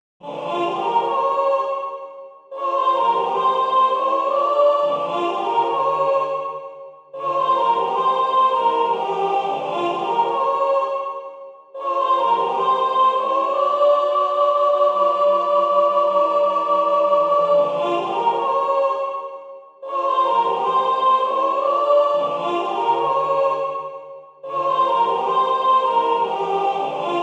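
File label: The Ballad of the False Self soprano, wisdomchant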